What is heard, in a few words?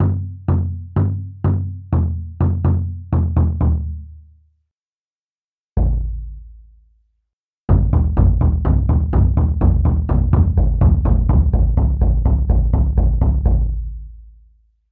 taiko drum